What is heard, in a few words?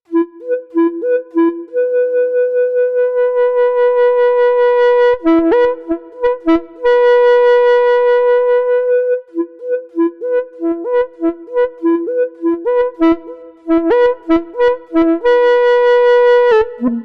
pipe changes